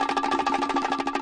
196 bongos bananas, drum loops and breaks

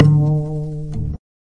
Upright Bass Notes

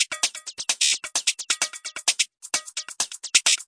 pumping electronic beat

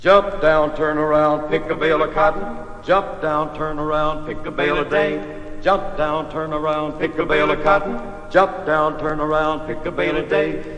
jump down turn around; MELODIC LOOPS; VOCAL OR VOXY LOOPS

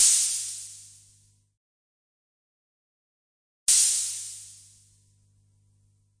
T6 Hats; DRUM MACHINES

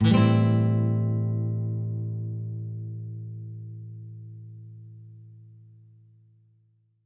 Nylon Chord A min7, nylon guitar chords

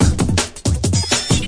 frenzied, drum loops and breaks, 161 bpm

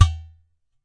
udu HIT, Udu Clay Hand Drum

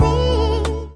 think punch, Melodic Stabs and Hits

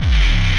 angry distorto kick; Kicks; single hits

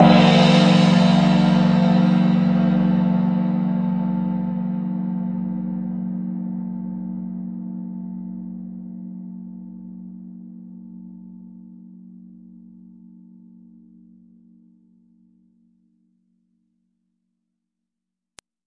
two tone gong; Gongs and Super Crashes; single hits

